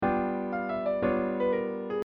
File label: Jazz Phrase